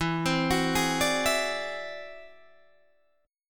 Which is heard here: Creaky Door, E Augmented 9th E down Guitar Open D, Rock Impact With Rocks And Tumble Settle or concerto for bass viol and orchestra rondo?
E Augmented 9th E down Guitar Open D